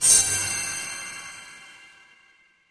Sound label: magic scifi portal